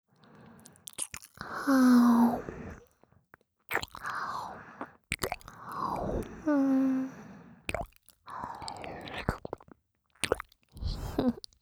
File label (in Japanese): 右耳舐め; ディープキス＆耳舐めオンリーオナサポトラック